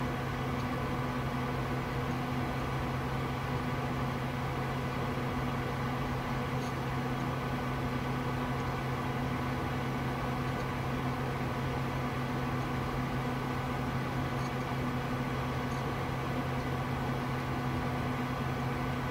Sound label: idle loop